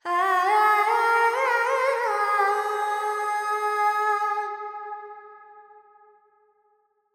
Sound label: Pentatonic, Vocals Carnatic Female Alaps 70 G